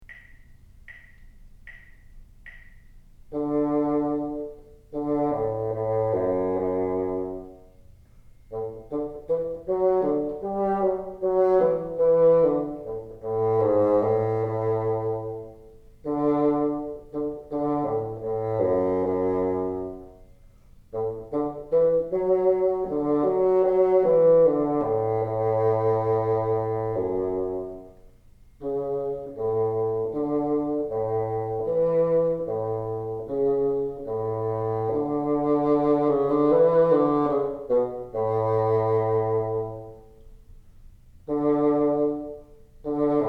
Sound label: teacher, bassoon